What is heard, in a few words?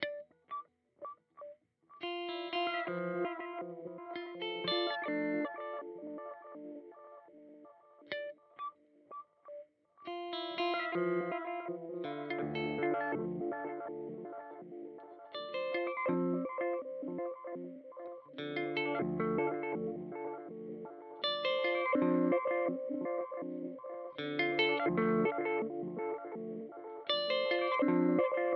Memory Boy Modulation